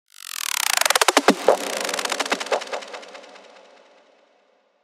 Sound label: FX Growling Noise; serum2 presets; JLS Fractalis